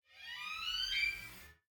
Creak; lethal company; ambience; windows

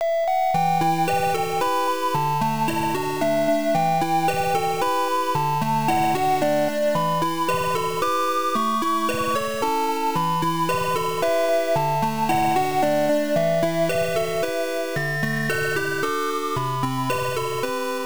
Desert Wind; Kaze no Densetsu Xanadu; The Legend of Xanadu; pc engine cd

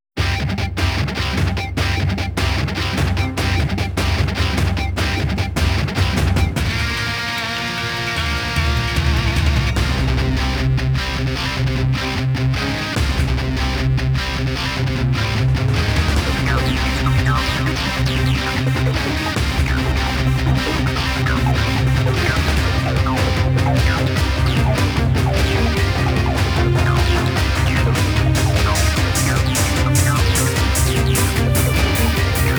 Thunderbolt; Devil Kings

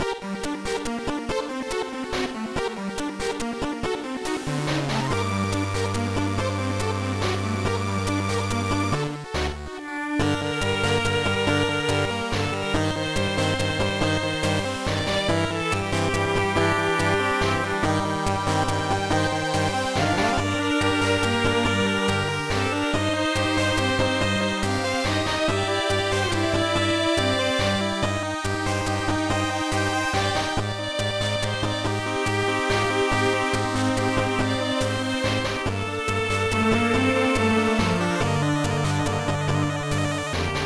BGM; oriental blue ao no tengai; gba

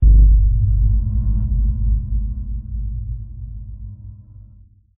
Ambiance Undercave Beat

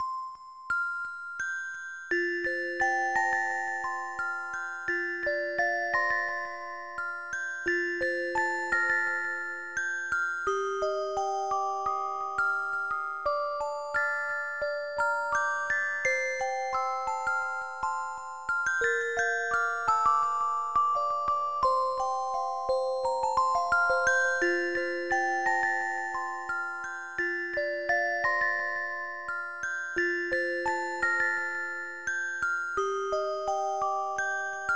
Spring colors -MusicBox-; My Merry May; dreamcast

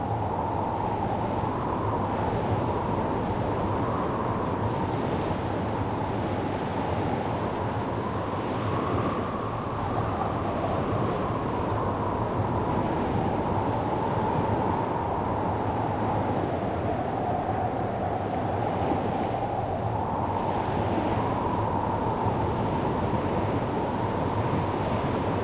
Ambient

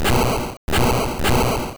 FLAMETHROWER; sfx; attack moves; pokemon; gen 1; rby

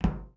FOOTSTEP Generic Metal Hollow Run; Don't Be Afraid; The First Toy

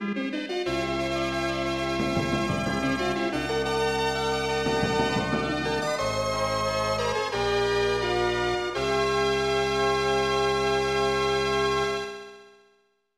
Grand Victory; Paladin's Quest; Lennus; SNES

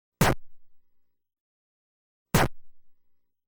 Hero Damage Sound (Small); Kaijuu Densetsu The Tale of the Shell Monsters

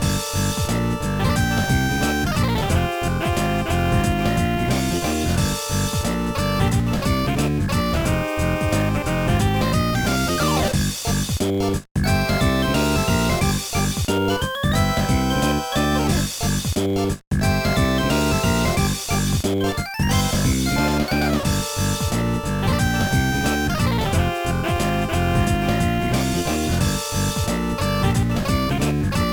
BGM; Dan Doh Xi; GBA

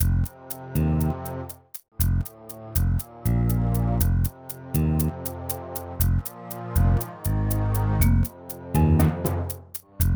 Music Loop; Digging for Dinosaurs; NDS

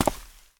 Step Concrete

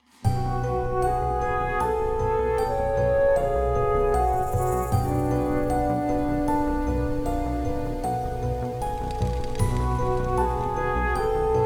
Flute; Spirit Hunter NG; Kakuya

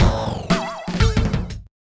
Lose; Diamond Software Shuffle

